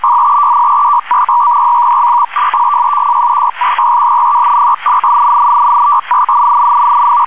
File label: pactor 2